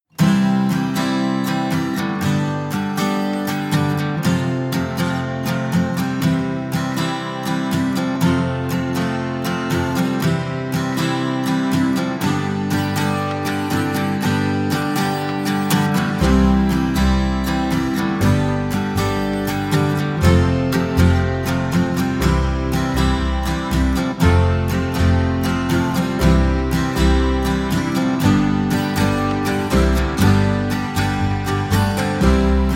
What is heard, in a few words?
no melody no perc